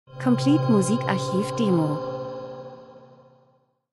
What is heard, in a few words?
transition; Transition & Movement - kalt künstlich tief dunkel